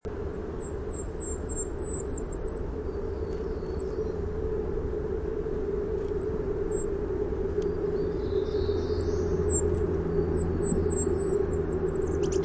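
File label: song, Golden-crowned Kinglet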